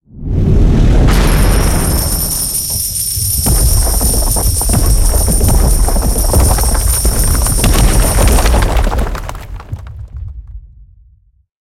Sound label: red Flame; hiddencoinsofegypt